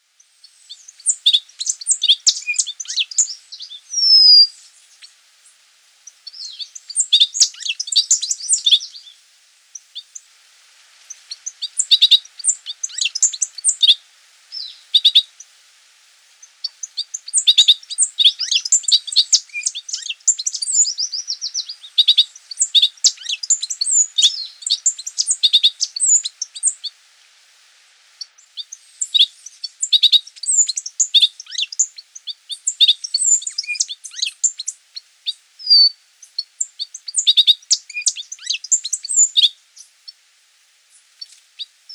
Organiste louis dor Antillean Euphonia; Guadeloupe; chants des oiseaux

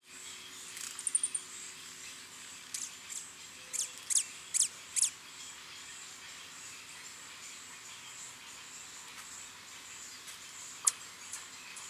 Eulampis holosericeus; Guadeloupe; chants des oiseaux

